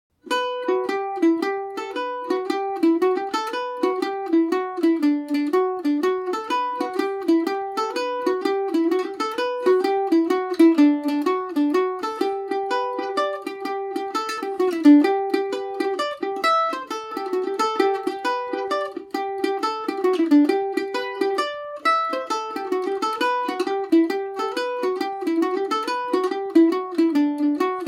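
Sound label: stoneybatter e minor, mandolin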